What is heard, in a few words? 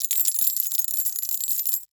Coins Drop; SFX Producer